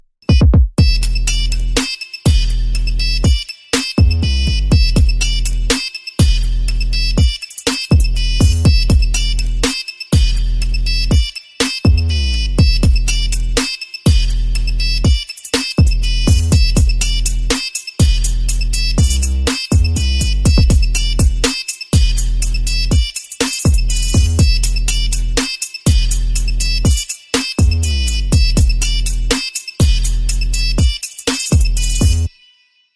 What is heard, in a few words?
nokia kick remix